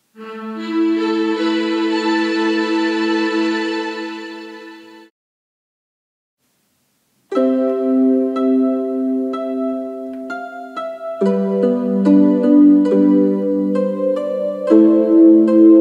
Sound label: MOVIE MUSIC